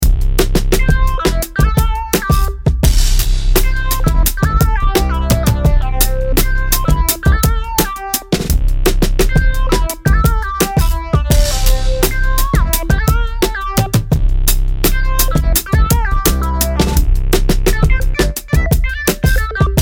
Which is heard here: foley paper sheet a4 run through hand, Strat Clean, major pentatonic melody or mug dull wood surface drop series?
major pentatonic melody